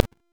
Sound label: Fire Shot Loop Stop; Warframe; Lizzie